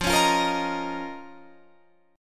F 7th Suspended 4th Sharp 5th F down Guitar Drop D